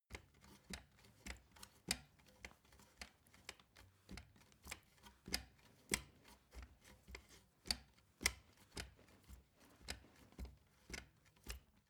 step ladder wood soft; schritte

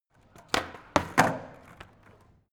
skateboard indoors kick

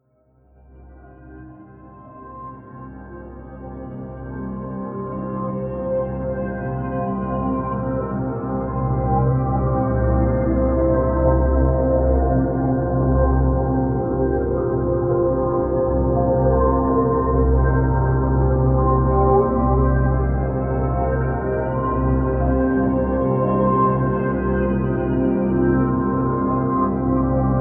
drone slow worm

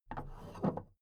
big plastic case handle take; objekte; kiste

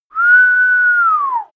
whistle call; koerpergeraeusche; menschen; soundpool

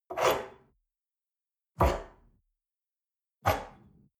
espresso grab from table hard, restaurant cafe

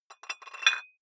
dumbbell thread put on small weight soft; sport freizeit; fitnessstudio

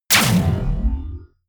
sci-fi plasma cannon fire; schusswaffen

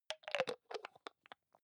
hygiene small screw cup close soft, badezimmer

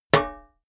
plate hit damped hollow

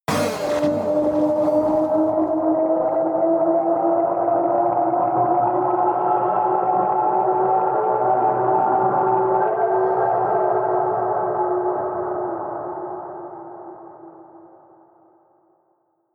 stab electronic rise, drones; musikelemente

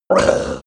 ogre hit; kreaturen

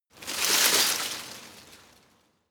scrub small branches hit; haus-garten

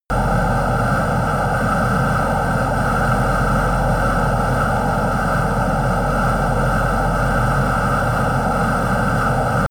plane boeing 777 turbine idle direct; flugzeuge verkehrsflugzeuge; verkehr transport

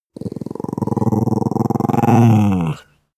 dog small growl regular; natur-tiere; hunde

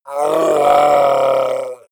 orc call painful long; kreaturen

